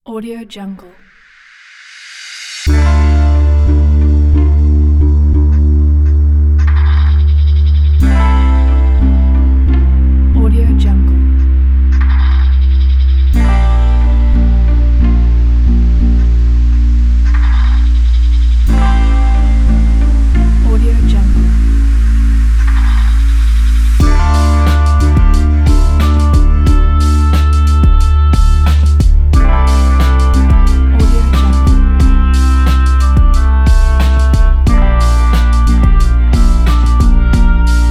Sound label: lounge ambience with oboe guitar and strings